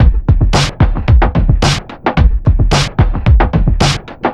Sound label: 110.4 bpm hip-hop, Drum Loops